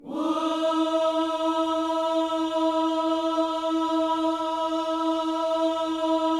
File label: WHOO E 4, Vocal Planet Gospel; Spectrasonics; Vocal Planet Vol.1 Gospel; akai